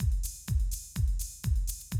125, Classic Drum Loops

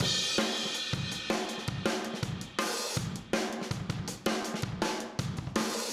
Room, Drums, 162 BPM; Drums from the Big Room The Mixes; Take Your Toms Off; Verse

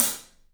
Paiste hat, drums; 3D Sound

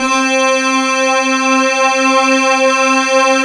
VECTOR SYNTH

